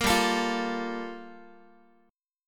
G Minor 9th, Guitar; Gm9; strummed; 13,15,12,15,x,x

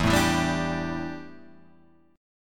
D Suspended 2nd Suspended 4th E

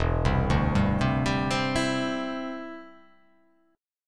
E Minor 7th Sharp 5th E; arpeggiated

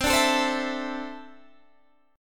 D 7th Suspended 2nd, C

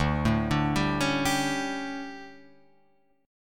D Major 7th Suspended 4th Guitar Drop D, chord

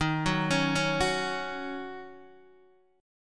D sharp Minor 7th, Guitar, DADGAD

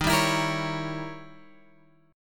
Eb 7th Flat 9th, Eb, Guitar, Open F; strummed

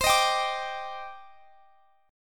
Eb Major 7th C; Ebmaj7/C; strummed

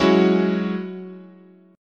F sharp 7th Suspended 2nd, guitar chord

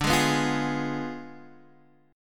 D Diminished 7th, chord

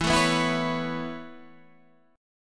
B 7th Suspended 2nd Suspended 4th, chord

